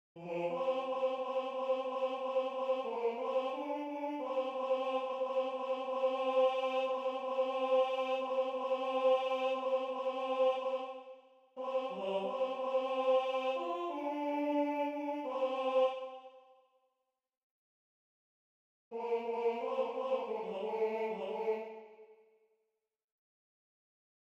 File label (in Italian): Tenore; I cieli immensi (Benedetto Marcello)